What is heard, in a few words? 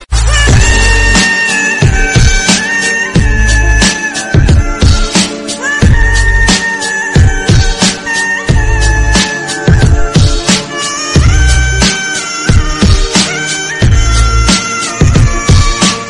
sad mood off ringtone